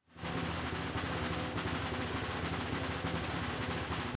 PC Rullo mezza vel

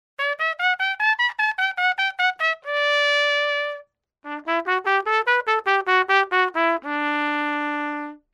Due Trombe

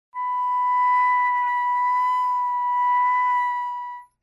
FL Labbra

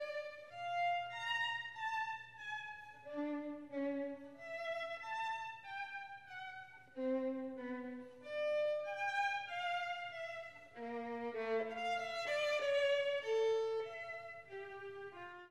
Bach ciaccona quarta variazione

